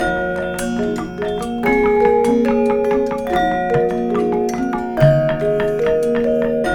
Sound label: Gamelan; Gamelan Orch